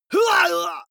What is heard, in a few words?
male fight pain shout; comedy-cartoon; soundpool